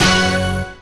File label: scatter Sym; hotline2